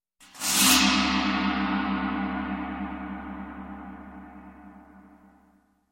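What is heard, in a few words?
grinding sound of gong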